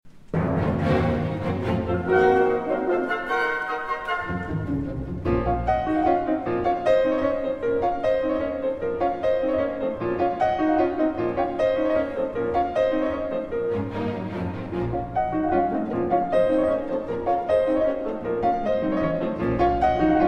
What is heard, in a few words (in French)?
concerto pour piano, allegro vivace; TCHAIKOVSKI